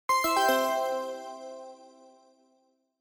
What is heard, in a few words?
Chime Announce